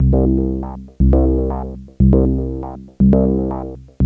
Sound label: moog seq; AMG - Vince Clarke Lucky Bastard